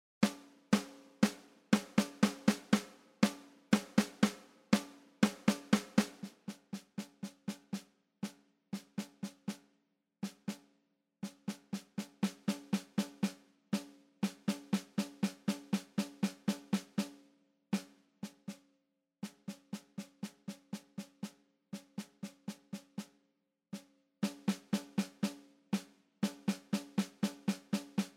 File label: snare drum 120 bpm; etude no 3; level i